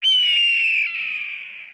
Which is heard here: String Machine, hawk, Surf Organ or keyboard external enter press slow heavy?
hawk